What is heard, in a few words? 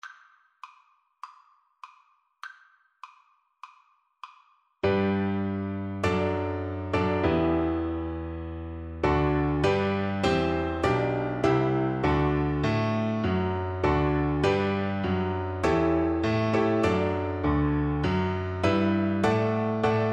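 viola karaoke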